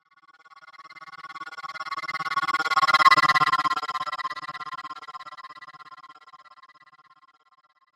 Spaceship Pass By, Scifi, Spaceship, Space, Age, Motorcycle, Pass, By, Science, Fiction; Spacecraft; BlastWave